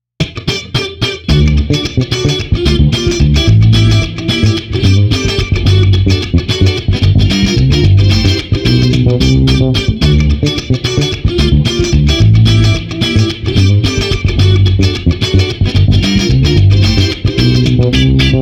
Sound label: Street Bass Guitar Funk Boogie 110